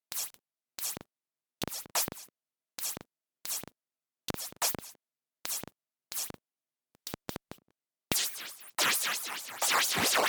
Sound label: CRASH Gate Mod